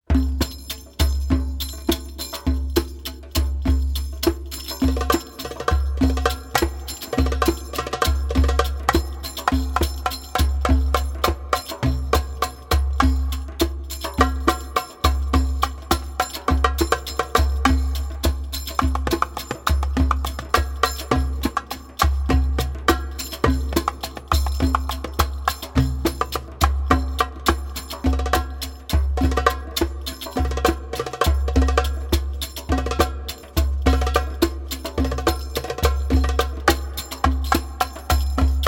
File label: Solo